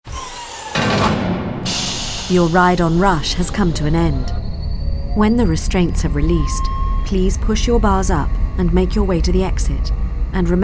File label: Ride End